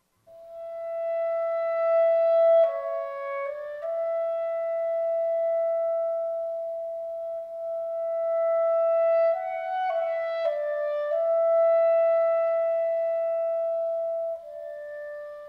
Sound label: Legato Fingers Rach 2 Fast